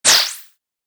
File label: acceleration